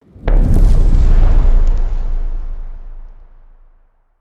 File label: Giant Fireball Blow